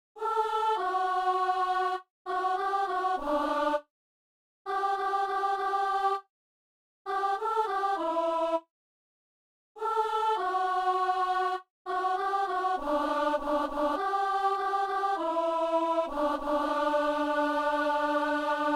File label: alto; Ces mots (P.U.); Messe du 17 janvier à Saint-Denis